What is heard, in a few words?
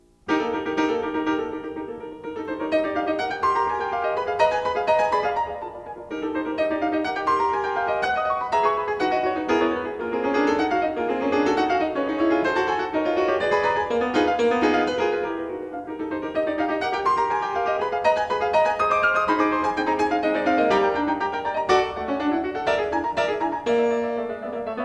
Pictures at an Exhibition for piano; Mussorgsky; La Grande Nouvelle